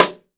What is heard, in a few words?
snare drum, jungle snare chromatic